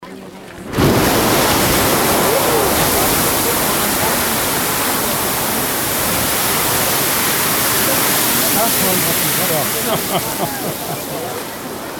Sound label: Geisir Strokkur Sounds and People, Iceland